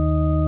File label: d sharp 3, music keyboard